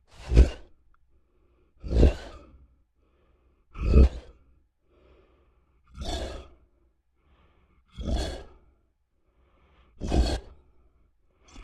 Creature Large Grunts Efforts Series; Monsters; Horror

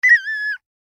Recorder Note Change; Cartoon; Musical; Cartoons; Blastwave

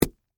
Stab Melon Hit, Fruit; Texture; BlastWave

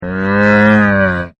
Camel Voice; Camels; Animals

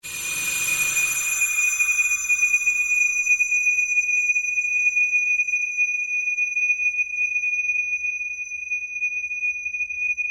SURGE FADE SLOW; sound building blocks created through manipulation of raw material; Rise And Dive

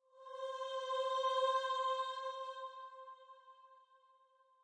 choir Ahh Cm 160; Choir loop Cm 160 bpm; Choir Loops

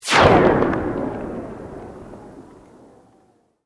Sweep Medium; Created sweeps, swooshes and other movement effects; Sweepers (Sound Effects:Sound Design)

